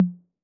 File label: Tom Hi B 808, Clean, Digital